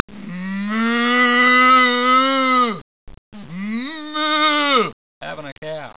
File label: moo